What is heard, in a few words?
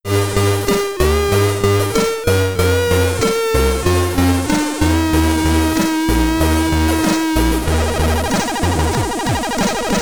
Game Over, SID Stereo; G.I. Hero; Commodore 64